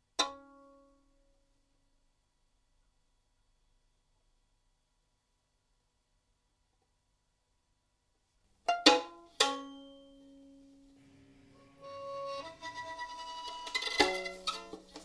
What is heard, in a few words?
violin